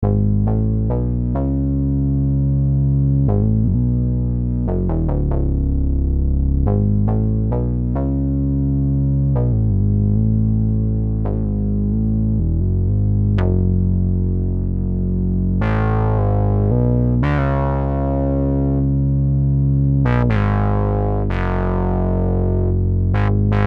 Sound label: bass Vel